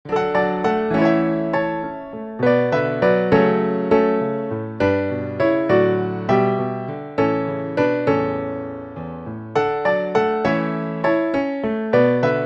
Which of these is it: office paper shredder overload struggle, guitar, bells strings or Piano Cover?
Piano Cover